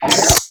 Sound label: Sidearm Charge; Warframe; Weapons; Tenno; Wraith